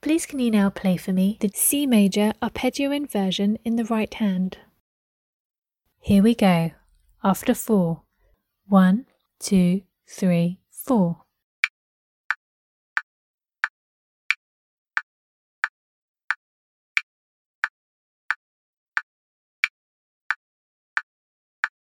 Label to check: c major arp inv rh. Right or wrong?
Right